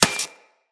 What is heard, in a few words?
Laser Impact Metal